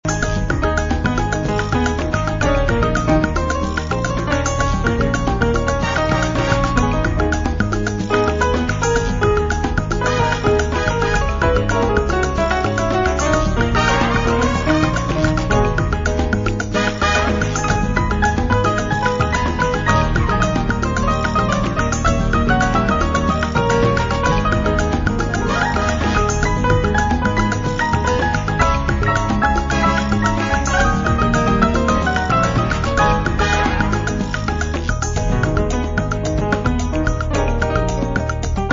Salsa with My Jazz

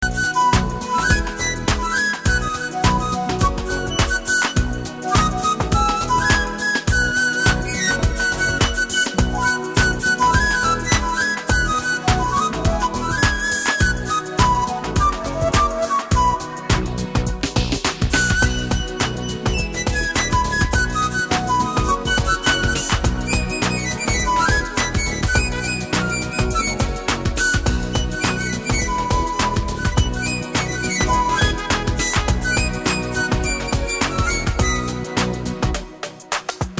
Funky Grooving; emotions

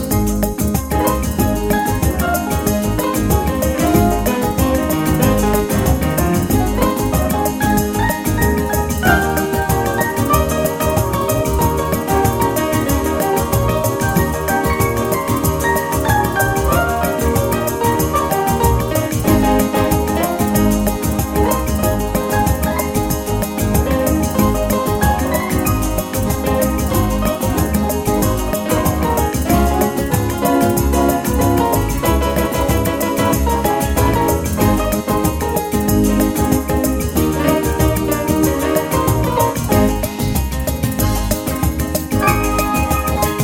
Fast Bossa Nova

